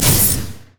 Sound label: dragon strike; LoL; jarvaniv lubu